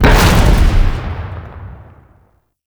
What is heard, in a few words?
Street Barrel Explosion; Warframe; Ambience; Nineteen Ninety Nine